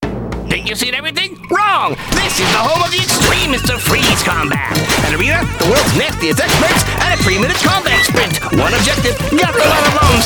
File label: (Cinematic) Freeze Combat; rayman arena; Murfy's Reports